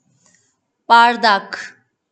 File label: glass